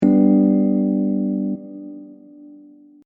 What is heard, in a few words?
Rhodes Chords; chorducopia